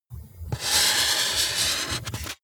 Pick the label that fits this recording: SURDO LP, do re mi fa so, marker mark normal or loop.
marker mark normal